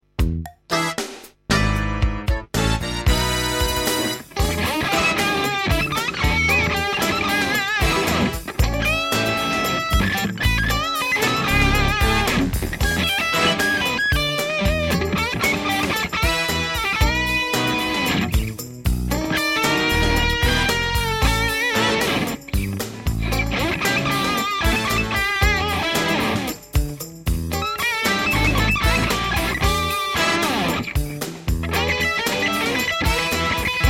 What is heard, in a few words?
Peavey Raptor Plus